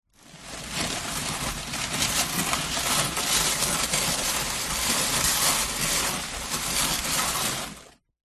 cardboard box drag scrape movement long on gritty hard surface; zapsplat